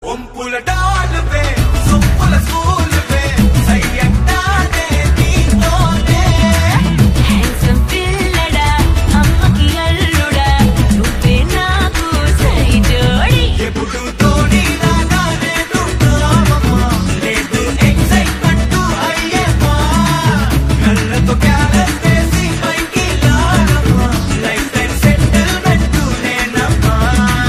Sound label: Ringtone, Telugu; Varun Doctor